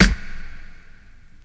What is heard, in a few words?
small reverb snare drum f sharp key